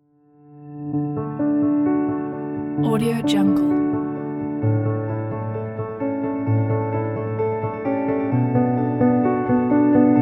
cinematic piano emotional music

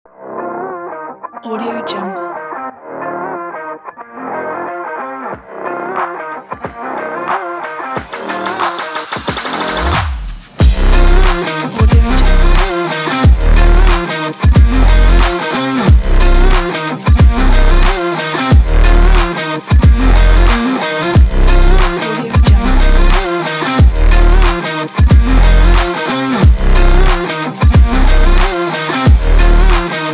the electronic rock beat

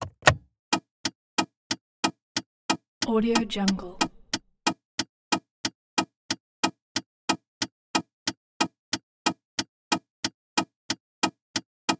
car hazard lights

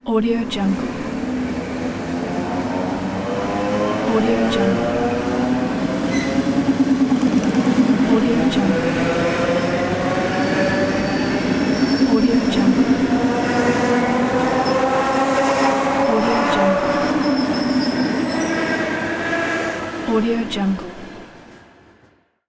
train departure

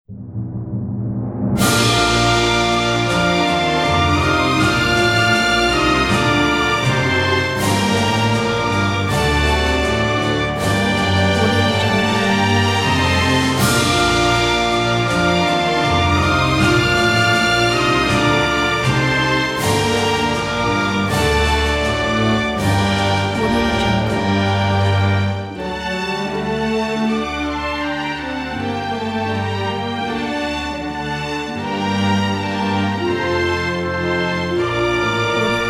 ukraine national anthem

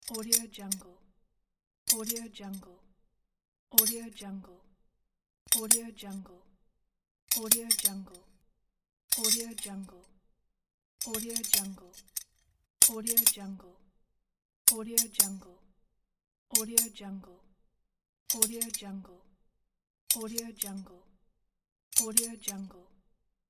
scrap metal layers; federico manuti

